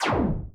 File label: empty recycle